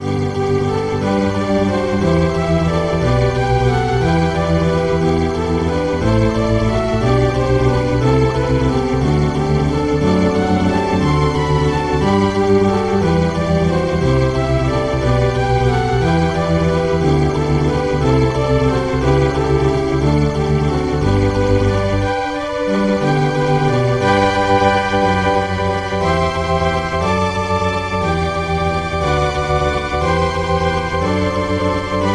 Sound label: warm pad